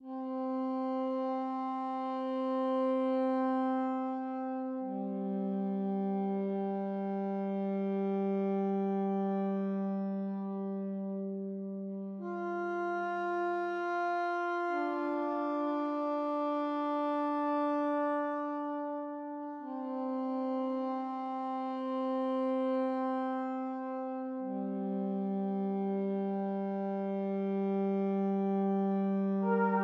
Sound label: Brass 98bpm